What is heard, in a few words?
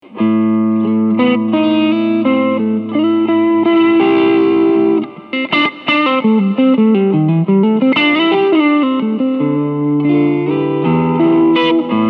Harley Benton JamBox Blues Reverb